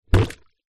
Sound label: household mop bucket plastic shallow water set down floor